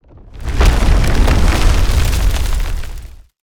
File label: ground crack tree